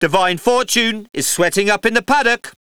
sweating; netent

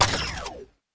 hotline deactivate; netent

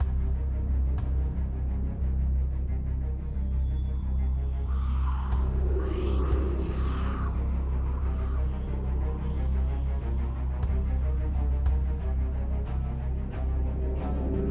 main game ambience; dracula

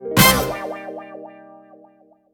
smallwin; netent

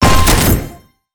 sparks wild landing; netent

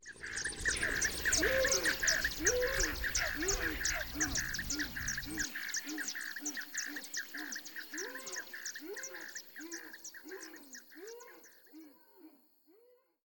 ambience morning

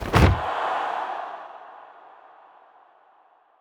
quick Stop; netent

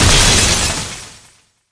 win Explode Bigwin; netent

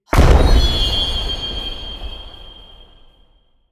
large win

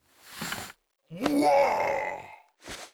blue Bird Win Arms; netent

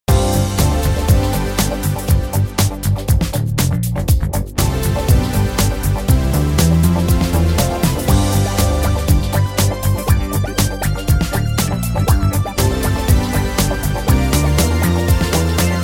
Ringtone

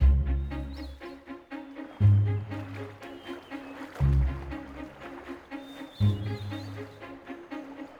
main Game Wait Loop; netent

